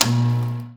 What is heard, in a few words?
swarm switch; ambient; revolution; Portal Revolution